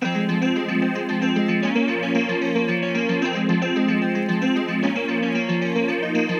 Various Melody Loop - 150 BPM F Min Guitar; Loop Stems; Cymatics; 2020 Melody Collection; Various Melody Loop 9

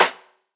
Snare, Lo-fi; One Shots; Ghosthack; Drum Mega Bundle Demo; Hip-Hop & Lofi Drums

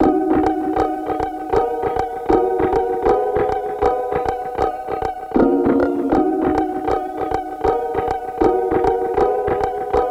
157 BPM D# Min Synth; Melody; Loop Stems; Cymatics; 2022 Melody Collection Platinum Expansion - Pt 2; Psychic

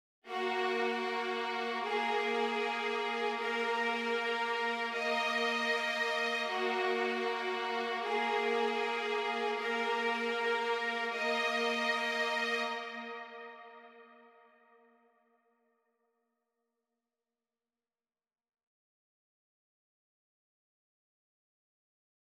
155 BPM D# Min Strings; Trap; Melody Loops; Cymatics; Nightmares Trap Melody Loops; Money Mind

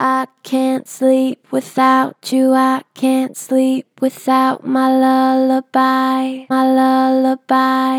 Gentle Flat, I cant sleep without you, Vocals; Harmor Vocals

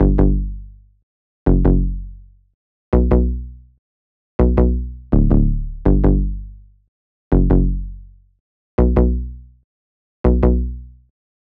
Moog One Bass, 164 BPM B Min; Cymatics; Horoscopes

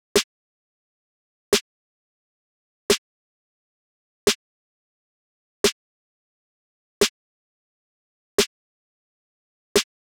Drum Loop - 175 BPM Snare; Loop Stems; Cymatics; DOPE DRUMS VOL. 1; Jumpshot

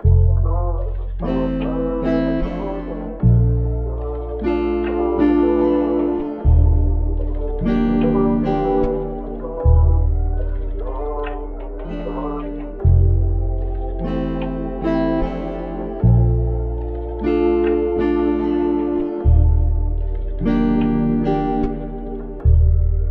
75 BPM A# Maj, Melodies; Cymatics; 2022 Melody Mystery Expansion

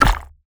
Craft Air Bubble Suction Pop Cork Water UI; User Interface

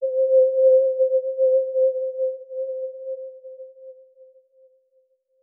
PAD Short - C, Synth One Shots

